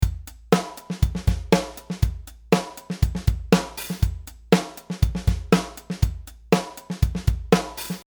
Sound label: On beat ghosts